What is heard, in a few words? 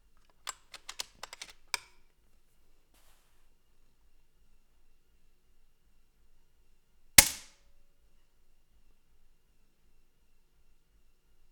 2 shots pre 2 shots post tube depinger; air tube bottle brush depinger